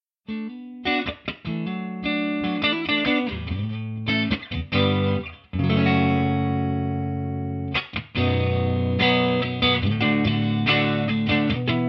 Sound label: clean